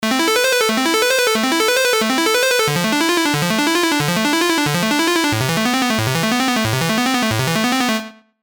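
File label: Sylenth1, arp; CHIPTUNE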